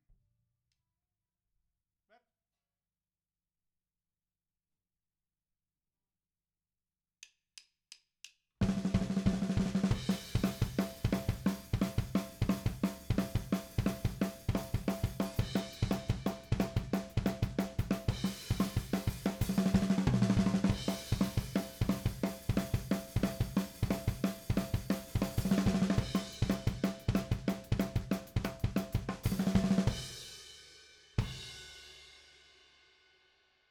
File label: Drums; Bridge